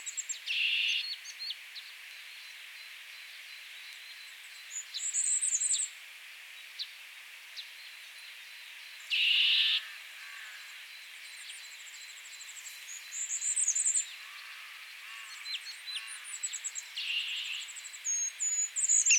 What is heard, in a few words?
Kashmir Nuthatch Sitta cashmirensis, kashmiri nuthatch call